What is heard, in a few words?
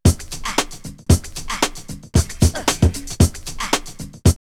Boogaloo 114, drumloops, Rave; X-Static Goldmine; 90 s Sample CDs